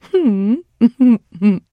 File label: trader giggle; 商人杰恩（七日杀）